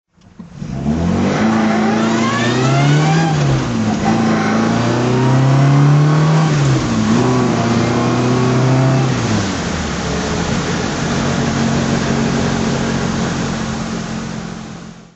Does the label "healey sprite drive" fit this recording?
Yes